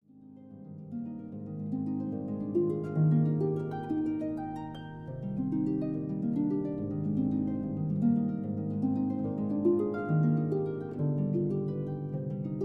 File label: Harp; Snoer; Morgenstimmung